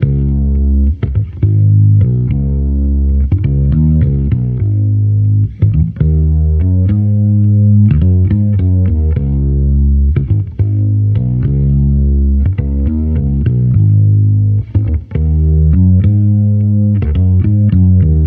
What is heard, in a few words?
Amped loop 105 C#, Electric Bass Loops; Slate Digital BASSHOLE Sample Pack